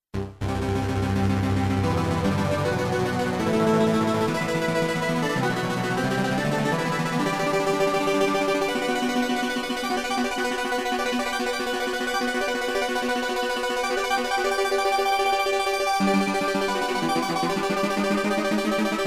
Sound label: UDO SUPER6